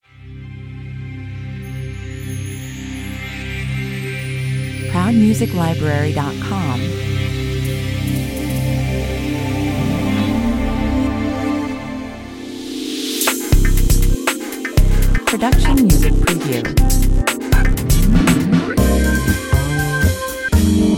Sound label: weirdology 120 bpm